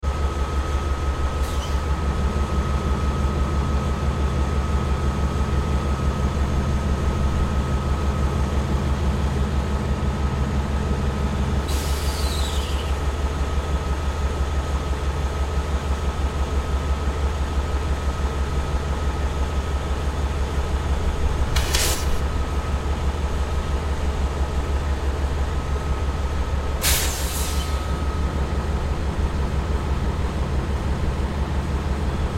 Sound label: Locomotive in idle